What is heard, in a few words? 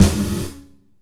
Gated Snare; Univerb; creative gate; Gated Reverb